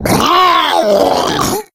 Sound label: female boomer spot prey, voice, attack; Left 4 Dead 2